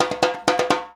FILL, Percussion; InVision Interactive; Percussion Slam

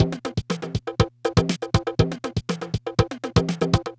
Loop, dd-5

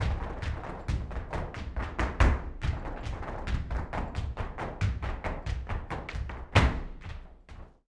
flamenco tapping, alegrias; ProSamples vol.46 - Flamenco; Best Service

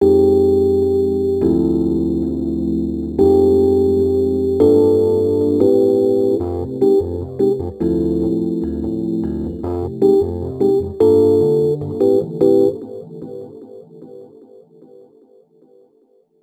Rhodes Attacks; Ueberschall

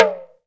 TALK DRM, Heart of Africa; Spectrasonics

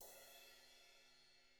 BRUSH CYM, Drums; ILIO; Double Platinum Drums 1

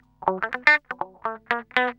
GUI, LICKS; 120 LICKS

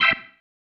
Stab, Organ, One Shots

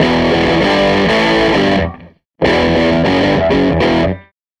SG Guitar Loop

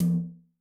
Brsh Rk Hi, Tom, Yamaha DTXpress-II

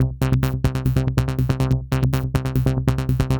Synth

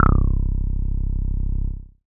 Acid Bass, Dance Synths

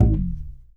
TUB BASS DRP

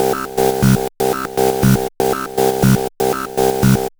MODU C, Modular Synth Loops; Polyend; Simulacrum Sounds

